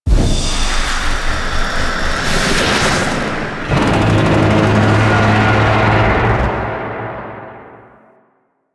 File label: Void Dragon Transference; Enemies; Warframe; Duviri